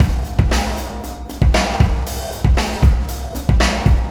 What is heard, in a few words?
Beat, Processed Drumkits